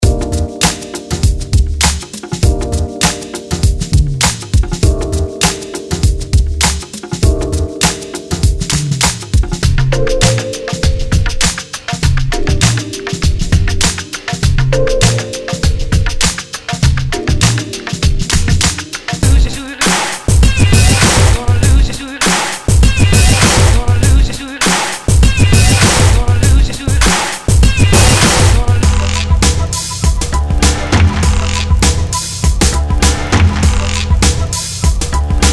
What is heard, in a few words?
loops